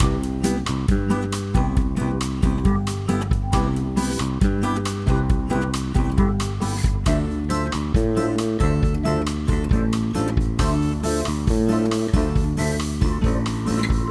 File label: bossanova intro; Summer Catchers